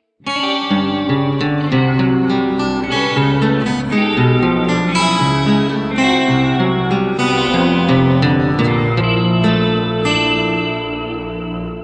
fusion acoustic guitar solo fusion guitar chords fusion rock; fusion music; rock style